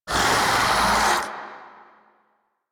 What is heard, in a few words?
MITW Triangle Pain D; Warframe